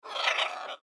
Bird Raven Squawk; Bird Raven Squawk Moan; Bird Sound Effects; Animal

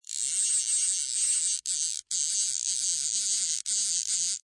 Fishing Pole Reel In; Winding Sound Effects; Lynne Music

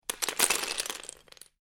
Lego Few Pieces Drop On Floor; Miscellaneous Toy Sound Effects; Lynne Music

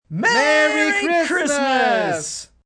Two Men Merry Xmas, Cheering, small group; Party Sound Effects

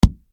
Impact Punch Body Hard Solid; Punch And Hit Sound Effects; Punches and Hits (Sound Effects:Combat); SFX Bible 6

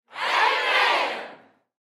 Human Crowd Studio; Crowd Reactions Amen Loud Southern; Sound Effects:Voice

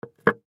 Can Food Set Down, Can Set Down Table; Set Downs; Foley; Sound Effects:Objects; SoundEffects-Blastwave

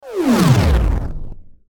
Whoosh Swell Static Reverb; Blastwave

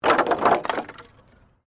Wood planks debris; Materials

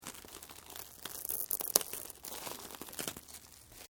GLASS LAMINATED TWISTING; Material components for constructing more complex sounds; Glass (Sound Effects:Materials)

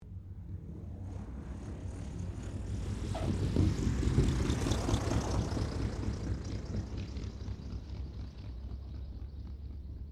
AIRCRAFT T-28D TROJAN LAND; Airplanes; Sound Effects:Vehicles

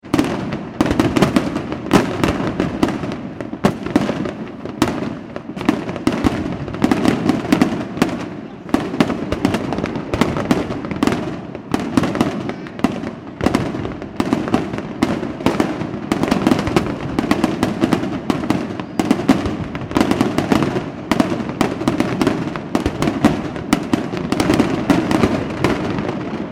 fireworks display intense; Intense Explosions At Large Commercial Fireworks Display; McKinney Sound

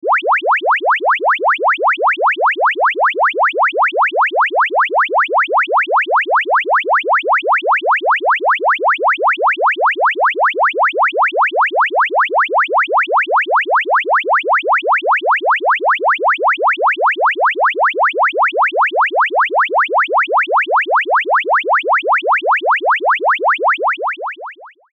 Car Alarm; Transportation; Car Sound Effects; Cars (Sound Effects:Vehicles)

